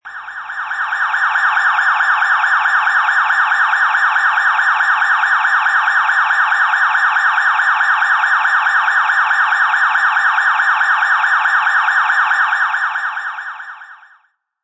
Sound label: Police Siren, A High quality stereo recording of a police or ambulance siren; Sirens; Sound Effects:Electronics